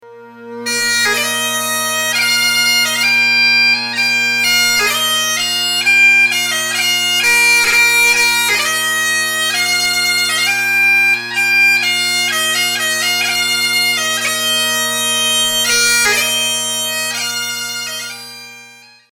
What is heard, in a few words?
Trumpet Voluntary, bagpiper